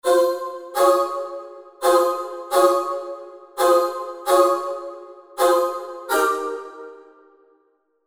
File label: Choir; HALion Sonic SE